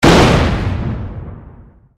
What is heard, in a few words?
explosion; Jungle Rumble